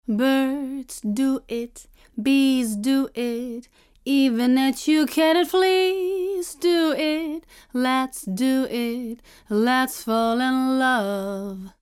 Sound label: Vocal Pass EQed